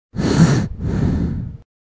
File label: gas; metrocraft